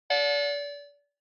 Bingo Machine Digital Accent Speaker

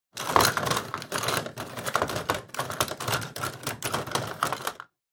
Pen Holder Rummage

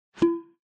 Pop Low; Onoma

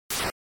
Noise Phrase, NES; NES-General

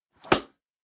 Book Close

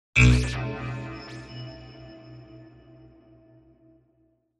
Cyber

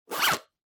Pencil Case Open

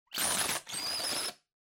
Blowout Triple

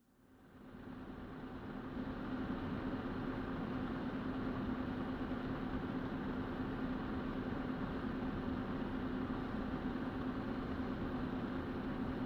PC Operating Noise Long

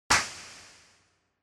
Balloon Pop Dry

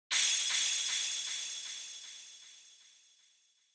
Flash Delay; Onoma

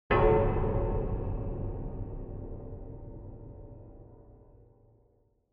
Negative Low; Onoma

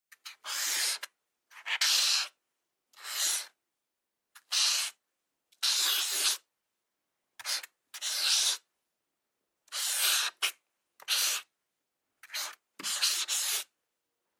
Felt Tip Pen Write

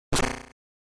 Noise; GB General

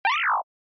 Motion Pop

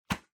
Sensu Open

